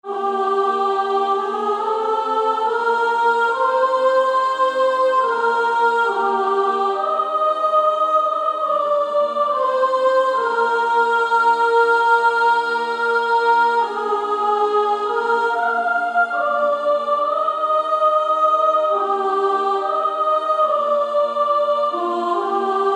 Female Choir; Lead Me Gently Home Father

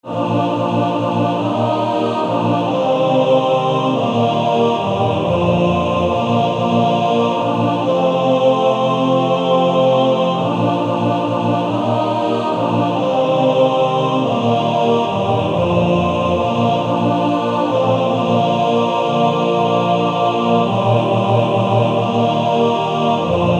Male Choir TTBB; Only a step to Jesus

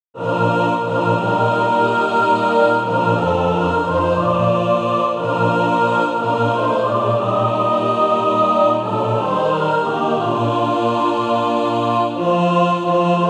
mixed

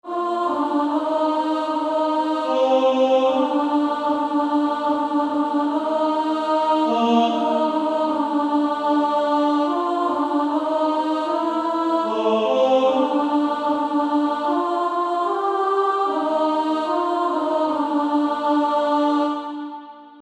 Female Choir; Jesus Calls Us; 1st Alto

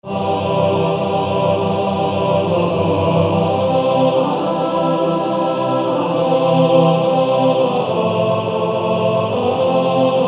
Male Choir TTBB

